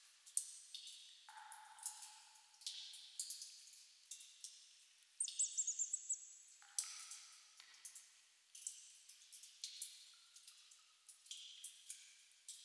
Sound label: Cave Amb Loop; Survival Game Sounds - Lite Edition; Environment